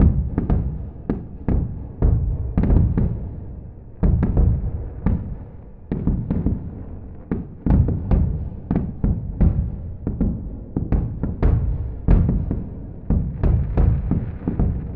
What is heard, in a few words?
Fireworks; LOOPERS